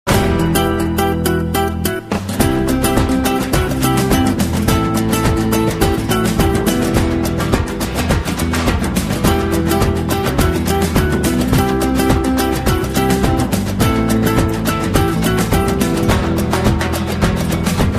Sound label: RingTone; رینگتون موبایل 5